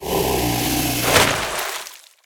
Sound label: Sand Worm Die; Creatures